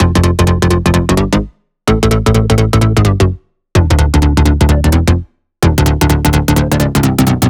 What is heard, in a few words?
128BPM Melody; Vengeance Samples; VFH1 128BPM Australian Kit